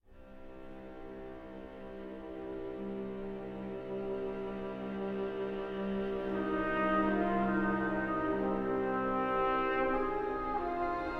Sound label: No Vocals; Assassin's Creed Syndicate